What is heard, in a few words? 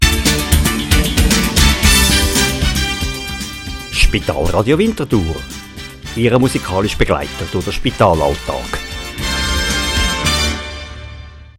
Jingle Station ID